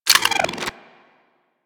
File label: Sapient Pistol Reload; Warframe